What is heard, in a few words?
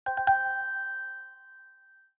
mms notification